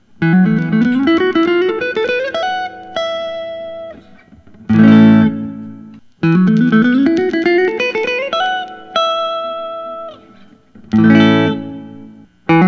tom anderson hollow t classic tobacco

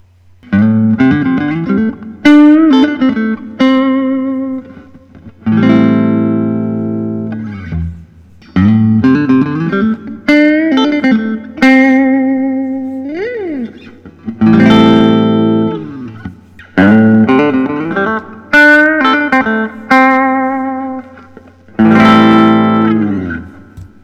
tom anderson drop t classic

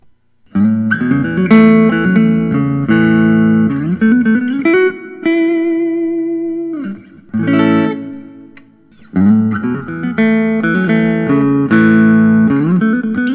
paul reed smith 594 semi hollow

